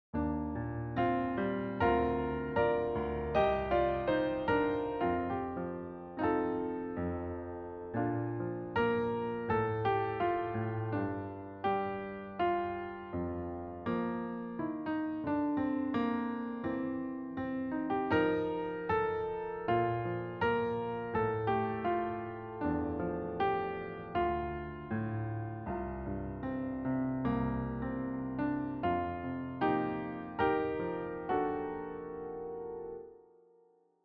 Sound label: solo accomp